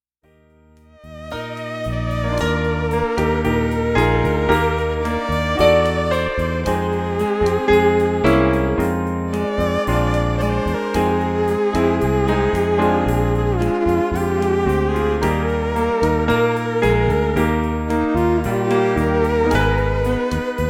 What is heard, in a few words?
Violin with Keys Bass and Drums; Cocktail and Dinner Music; O Sole Mio (Violin with Keys, Bass, and Drums)